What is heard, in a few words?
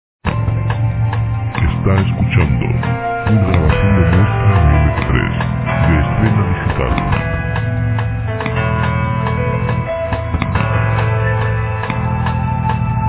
document lent; documentales